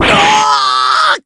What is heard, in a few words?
shock; kurogane